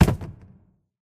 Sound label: phys cube, physics